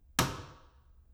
table foley; DoomRTX

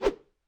Imp Attack Whoosh, Melee Whooshes; Foley

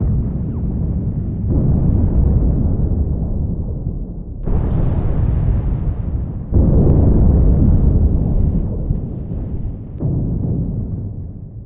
space battle ambient; Quake 4 Alpha; vehicles dropship

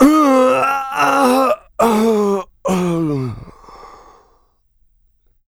death, player; DoomRTX

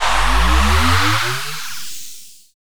shield charge, vehicles speeder; Quake 4 Alpha; id software

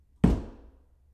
table foley

